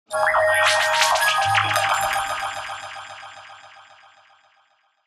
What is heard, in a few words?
melodic fx digital doodad Ab Maj; base pack